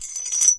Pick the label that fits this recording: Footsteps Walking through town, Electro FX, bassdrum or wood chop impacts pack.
Electro FX